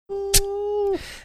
making funny sound scissor snipping

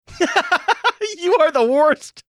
laughing saying you are the worst

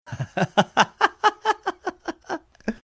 laughing

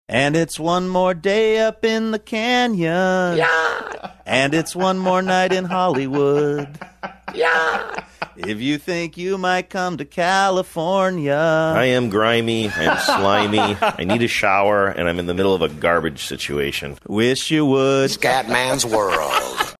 singing portion

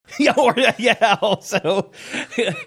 laughing trying to say something

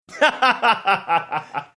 laughing

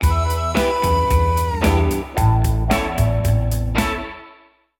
instrumental

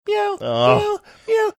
meow meow meow

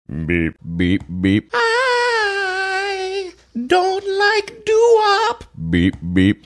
singing beep beep beep i dont like doowop

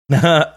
chuckle

